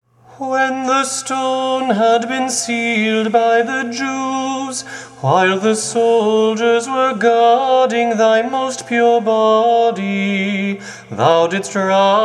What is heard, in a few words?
Res trop tone 1, Serbian chant